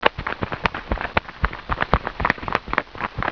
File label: Bird; 特酷声音素材库